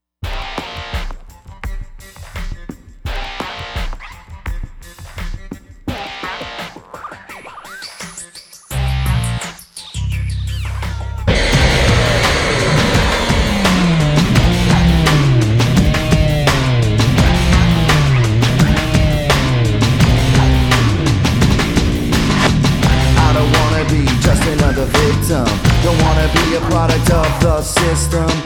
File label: Nightmare Rap Metal Production Music